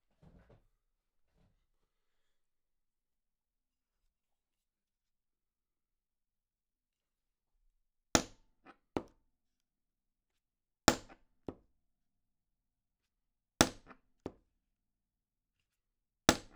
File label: Ambient Stereo; sand fuer Drehorgel Remix 2; Making Off